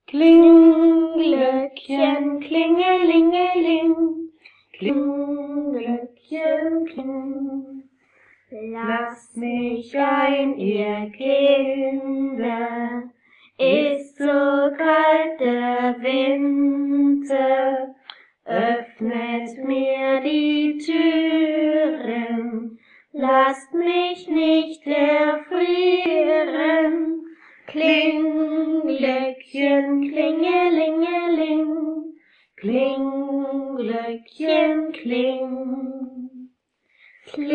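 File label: Kling Glöckchen, Banjo; christmas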